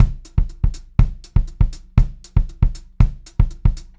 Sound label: 120 SPEED, Pop-Rock Drumloops; USB Soundscan vol.37; AKAI; 90 s Sample CDs